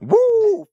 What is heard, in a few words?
"woo"